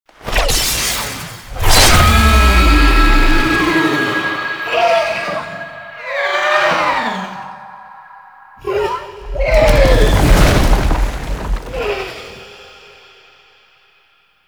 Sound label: Finisher; Warframe; Tau; Cinematics; MercyKilling